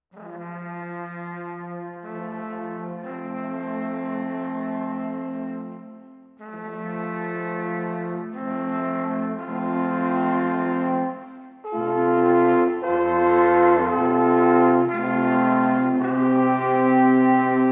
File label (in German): musik